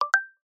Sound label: NFC Card Complete, iOS Sounds